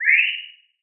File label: sparkle; DungeonsOfKharadum; wizard; animevox